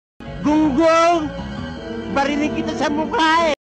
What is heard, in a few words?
gong gong